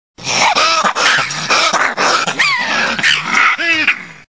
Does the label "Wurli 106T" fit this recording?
No